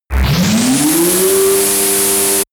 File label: buzzing overload; energie